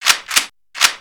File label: shake